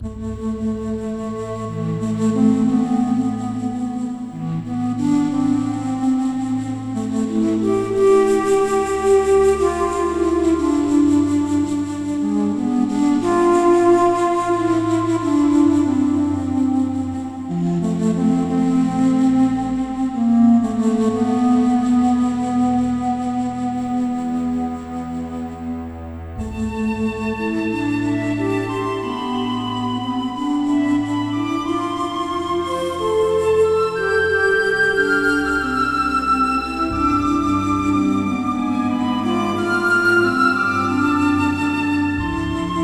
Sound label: love theme, music; wesnoth